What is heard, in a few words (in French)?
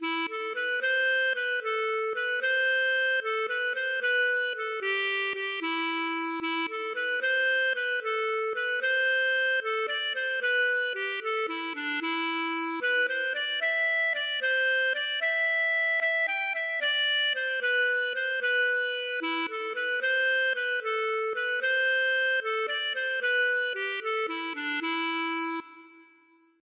valse